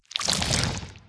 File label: slither short; smite; scylla